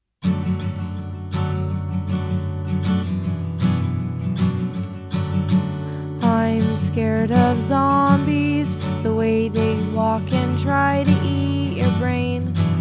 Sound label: Zombie Song